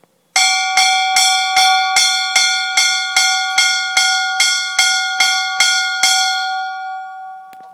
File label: Wake Up Bell; Crestridge